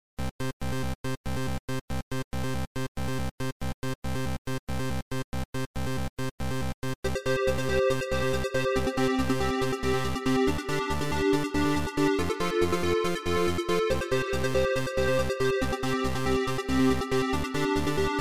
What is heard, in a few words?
Kicky Taps; Tumbly